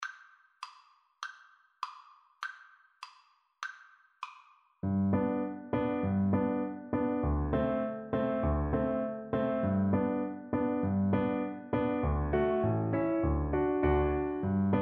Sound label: trumpet hornpipe violin